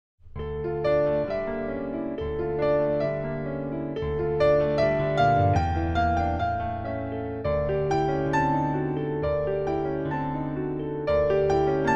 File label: Venice Grand D274